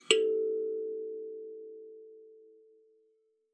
KALIMBA A4; Xsample VOL-7

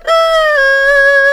kokyu C3#

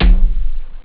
kick bongo; jazz jackrabbit 2

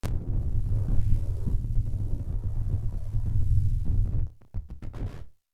Malfunction Synth, malfunctioning synth samples

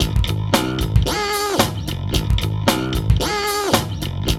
LOOP, Funky Ass Loops; East West

